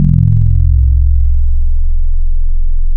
FM Pulsator, wavetables; Waves Codex

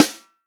snare drum, HIPHOP SNARE CHROMATIC; Best Service ProSamples vol.43; Real Drum Kits

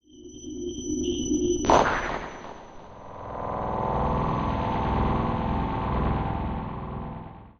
Wire Tapping, Strange Ambiences; Sonic Foundry (Sony Creative Software); The Electronic Music Manuscript Collection

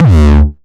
909 Grime Layer 2 - D2, Drums, Kicks; Mbase 11

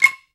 ONLONG, World Percussion

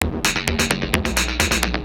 Industrial Beats

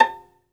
SOLO VI, Synclavier Strings; ILIO

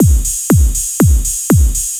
4 floor beat, trance; Sonic Foundry (Sony Creative Software); Trance NRG VOL-2; Drums; Complete 4 On The Floor

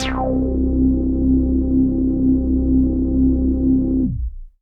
Pad C, Synth Prophet One Shots; Electronisounds

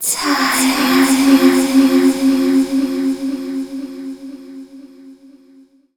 VOICE FX, Dance Vocals

